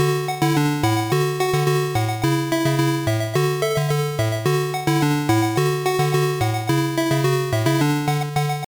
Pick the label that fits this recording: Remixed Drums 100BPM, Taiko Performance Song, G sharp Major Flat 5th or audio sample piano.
Taiko Performance Song